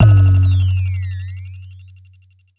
distbass; Futura; Ableton Foundation FreePacks; Wicked Kits

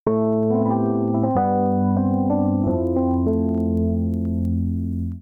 Dirty Back A Minor 92 bpm; MIDI Clips; Tonal; Accompaniment; Ableton; Factory Packs; Chop and Swing

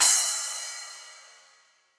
Splash 10 Deep Snare Dynamics; Drums; Drum Hits; Standard Hits; Cymbal; Ableton; Factory Packs; Session Drums Club

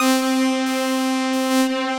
Dub, Synth Lead; Verse Able Dub

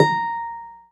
A#4, Keyboards; Model-C; Ableton Foundation FreePacks

